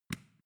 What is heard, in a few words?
switch small turn on hard